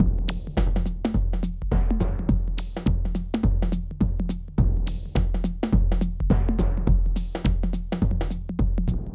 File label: Groove